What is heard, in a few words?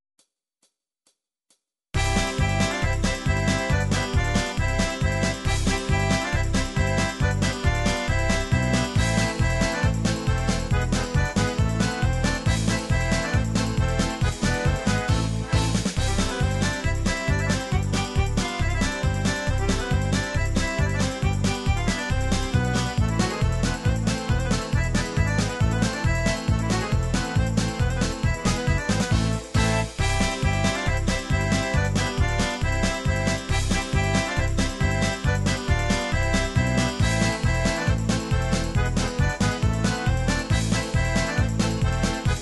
russian ukrainian, midi